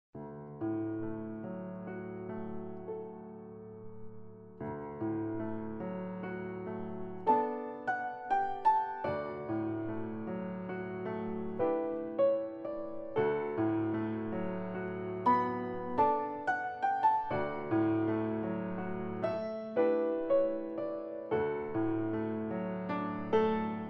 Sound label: Rhapsodie, Piano